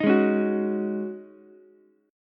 G sharp 7th Suspended 4th G sharp, Ukulele; G#7sus4 strummed; JGuitar

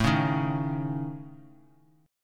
A Major Flat 5th, Bass Standard; AMb5; strummed; JGuitar

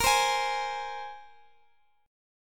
A sharp 7th Suspended 2nd down Guitar Open D

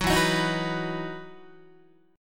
E Major 7th Suspended 2nd, Guitar

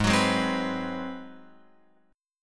Ab 7th Sharp 9th, Guitar, Open F; x,3,6,3,3,6; strummed

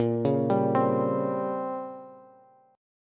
Bb Minor 6th Add 9th Bb down Bass; arpeggiated; JGuitar

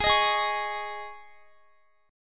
Ab Suspended 4th, Guitar; strummed; Absus4; x,x,18,20,20,20

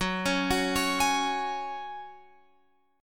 F sharp Suspended 2nd, Guitar Open F; arpeggiated; x,13,13,13,16,15

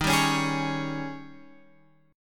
D sharp 11th D sharp; D#11 Chord {11 10 11 X 9 9} strummed

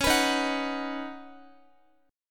Db 7th Suspended 2nd Suspended 4th, Guitar; Db7sus2sus4; strummed; tuning=4F,4A,5C,5G,5C,6E; x,x,13,16,15,14

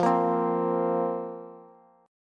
F Suspended 4th F down Bass; strummed; JGuitar

